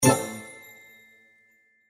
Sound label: prize reveal med; Fluffy Arcade: Doubleup Ducks LuckyTap; Eyecon